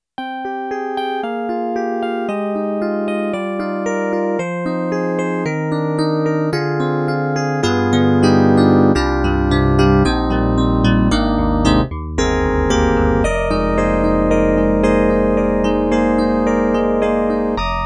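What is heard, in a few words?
SD-80 FM Hard Electric Piano; EDIROL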